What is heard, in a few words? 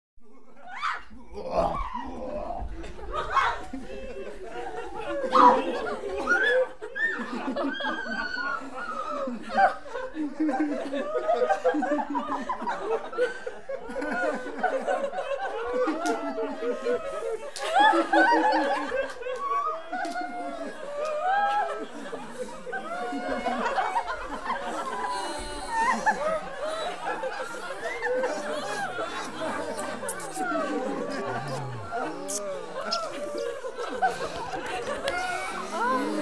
Laughing Tavern Patrons